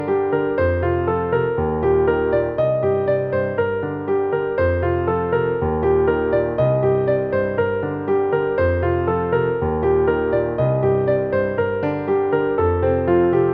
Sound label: Ringtone; Redmi K20 Pro Premium Xiaomi